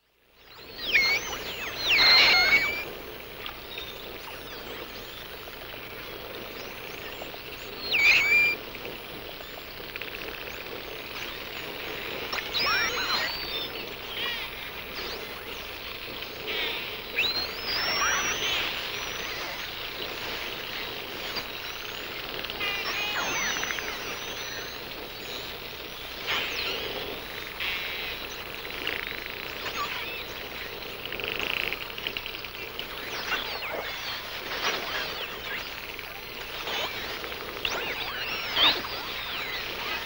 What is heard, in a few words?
Sounds of Nature - Humpback Whales